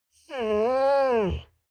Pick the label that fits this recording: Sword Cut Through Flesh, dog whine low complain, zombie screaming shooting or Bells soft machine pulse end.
dog whine low complain